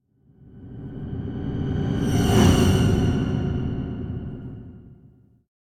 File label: Symphonic Hit Deep And Dramatic Final Chord - Scary; Scary Edition; Hollywood Edge; sound designer's toolkit v2